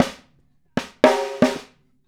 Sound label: snare pattern; Drums; Snare Patterns; Essential Percussion